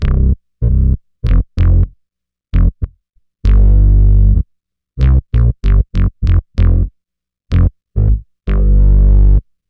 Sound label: Synth Bass; Basses